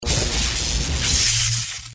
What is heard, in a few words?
lightning